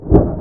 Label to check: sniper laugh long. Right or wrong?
Wrong